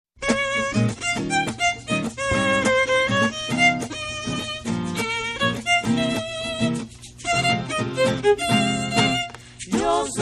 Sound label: Ritmo Tambo, latin mariachi; mariachi guadelajara